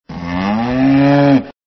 sons de vache

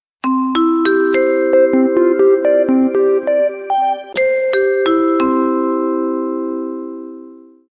whatsapp nouveau message

